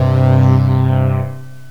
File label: 5th saw wave, synth lead; yamahaxg